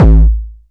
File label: kick, Korg Electribe ER-1; Kit 21